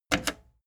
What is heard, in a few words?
plastic button slack click fast